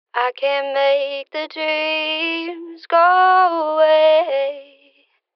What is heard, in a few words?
Phrase Dry - Dreams - 79 BPM C# Min, vocals; Cymatics - Eternity Sample Pack; Phrases